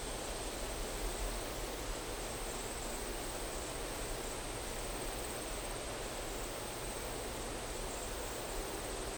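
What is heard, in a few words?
ambience forest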